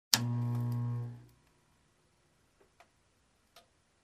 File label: Lamp switch on buzz